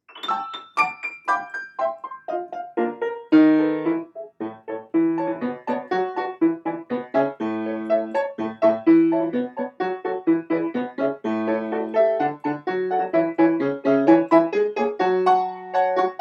improv A maj, piano improvs; a popular children’s song; A major

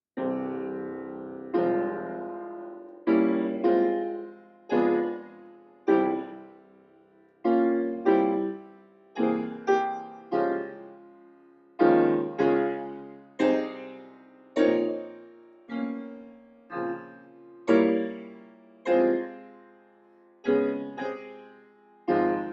improv F min, piano improvs; F minor; Let 2020 be forgot (F minor, 1:27); Michael S. Cuthbert; Noam D. Elkies piano improvisations

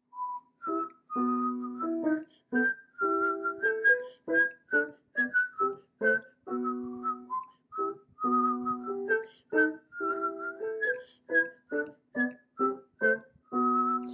piano improv E min; à la French(?) Baroque; in E minor; Whistle while you play II

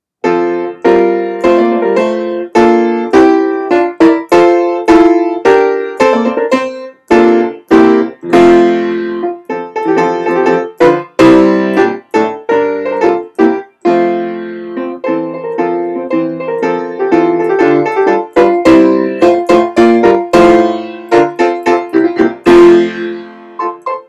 improv F maj, piano improvs; Seder mash-up; F major; Adir Hu; Bi-Mlu Chad Gadya; Noam D. Elkies piano improvisations

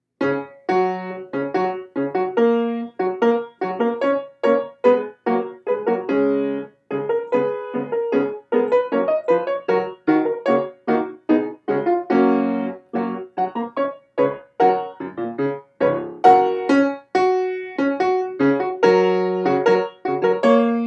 improv F# maj, piano improvs; F♯ major; Noam D. Elkies piano improvisations

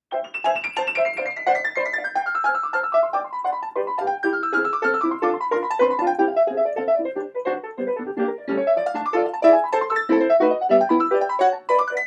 improv C maj, piano improvs; miniature; C major; Noam D. Elkies piano improvisations

